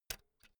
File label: pull out jack cable slow; objekte; elektronik